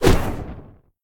recall punch fire; Smite; Susano

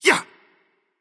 gruntjump; smite; voicepack; mercury

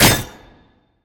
melee impact; smite; vamana

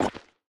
movement step; smite; odin

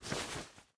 cloth quick; smite; scylla

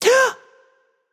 breath, voicepack; smite; jorm

